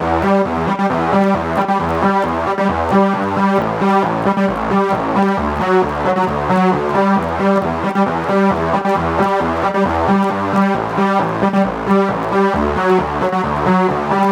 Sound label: 134 house fat synth lead Em; Dance DJ; Pro Tools Essential Demos; Digidesign; Essential Loop Demos; Demo Session - Dance DJ